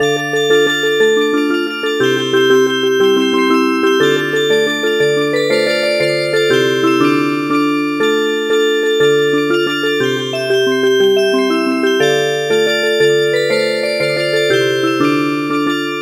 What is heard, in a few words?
Music Box, traditional; Drunken Sailor